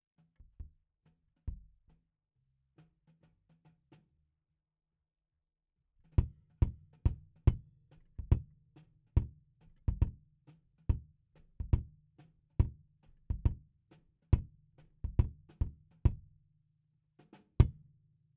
Kick In

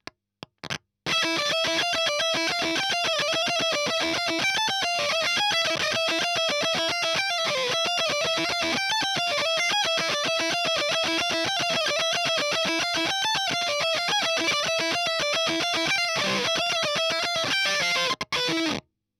EL Fuzz End

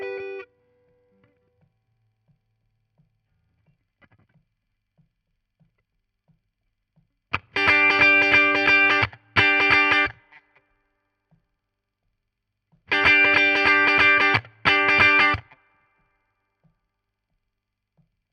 Guit Solo, RNR for piano

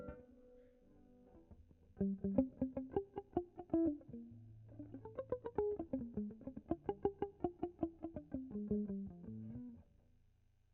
GTR

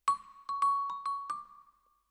Vibra Close

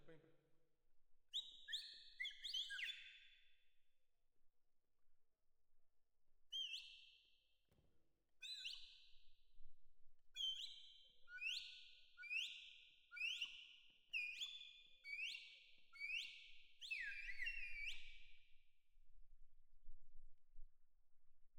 Perc Main

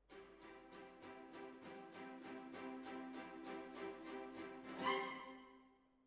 Hall, Jazz for piano

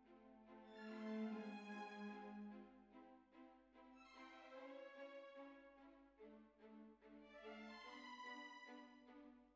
Bassoon, Jazz for piano

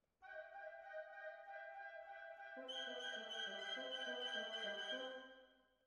Cellos

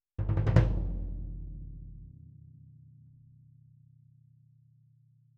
Cassa Rear

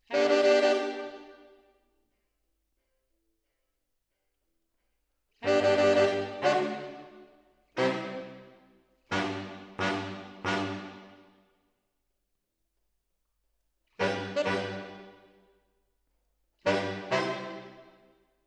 MKH AB, piano

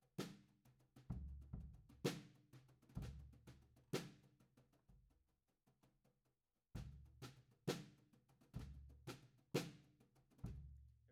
Floor, 96bpm

